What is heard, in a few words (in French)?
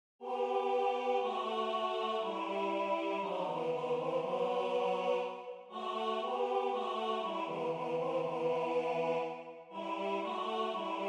les sans papers, 2 voix